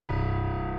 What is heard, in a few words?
Piano